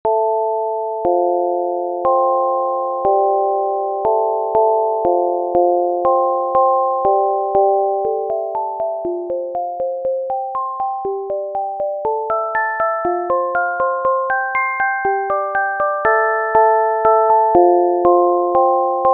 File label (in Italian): melodia